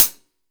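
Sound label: MIX closed hi-hat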